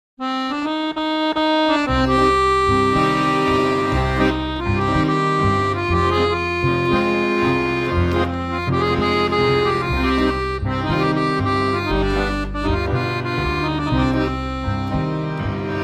Romanian Tango

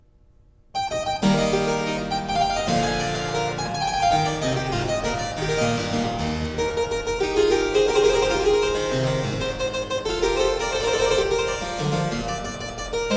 Suite 3 in E Minor, Harpsichord music; Boismortier; La Belliqueuse; Joseph Bodin de Boismortier

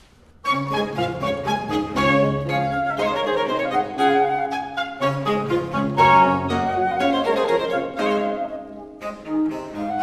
Air vif; de lacdemoniens; Suite in D from Les Fetes D'Hebe; Rameau

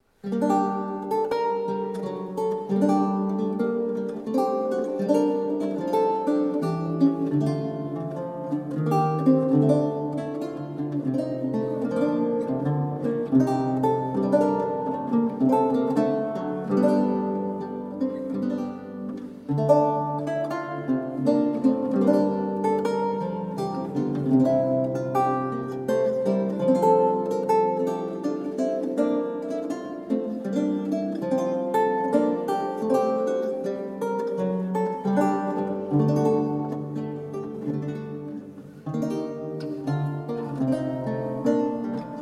Passion Galliarda, Lute music; Galliarda Dulandi; John Dowland; Lute songs

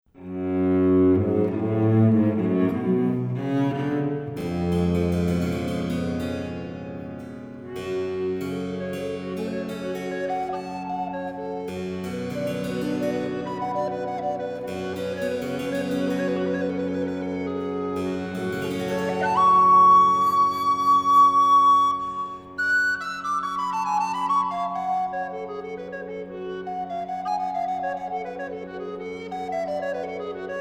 Sonata VI Harpeggio Adagio; Heinrich Ignaz Franz Biber; 1681

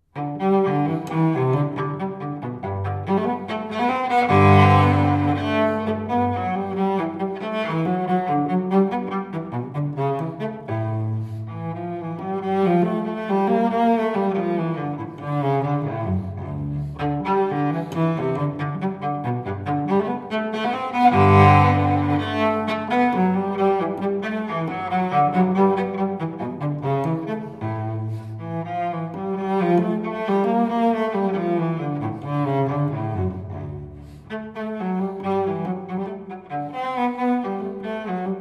Suite I in Sol maggiore gigue, violoncello solo; Bach; Sei suites per violoncello solo

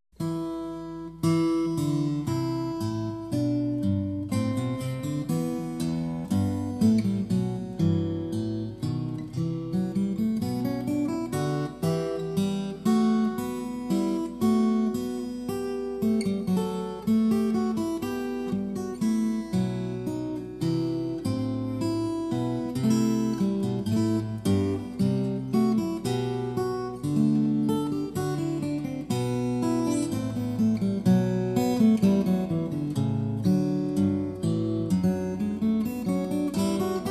Recercar, steel string guitar; Recercar 8; The Siena Manuscript

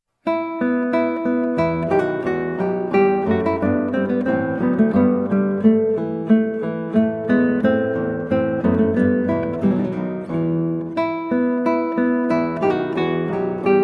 Gavotte from Trio Sonata in E Minor, Guitar; HWV398; George Frideric Handel

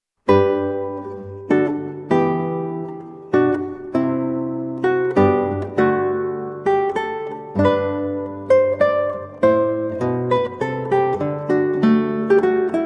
The Frog Galliard, 8 String Guitar; Dowland; John Dowland

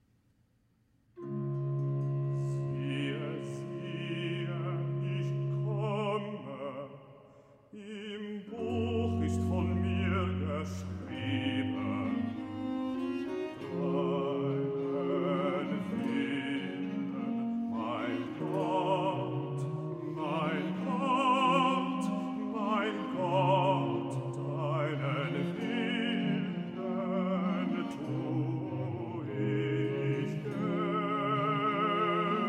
Recitative; Himmelskonig sei willkommen BWV 182; Siehe ich komme; Bach

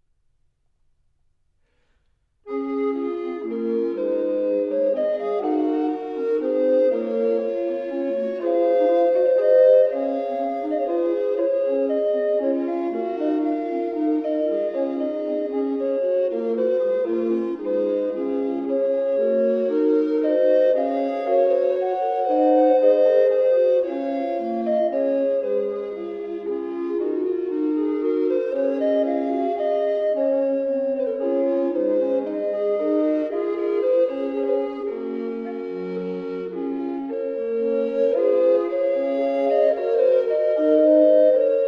Recorder Quartet; Es wollt' ein Mann versuechen sein Weiss; Ludwig Senfl

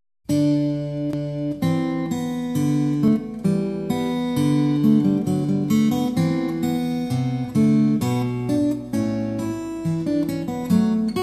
Recercar 10 steel string guitar; Il manoscritto senese della chitarra

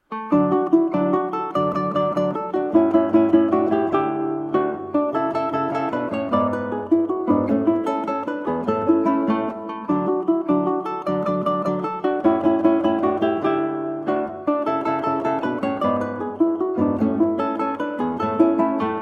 Presto from Baryton Trio, Ukulele and Guitar; Baryton Trio 1 Hob XI 1; Franz Joseph Haydn

